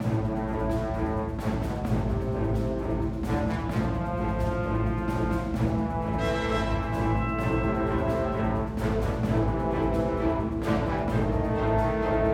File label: battle in space intro